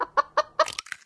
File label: Hen Egg